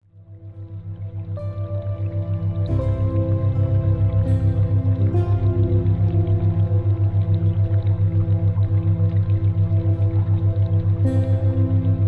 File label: music